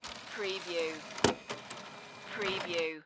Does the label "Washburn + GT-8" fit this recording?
No